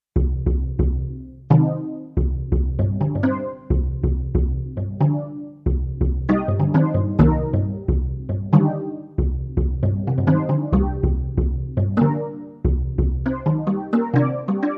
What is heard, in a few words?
Steel drum